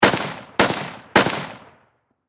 Warfare Rifle .30 Caliber M1 Garand Semi Automatic Triple Shot Distant Perspective; SFX Bible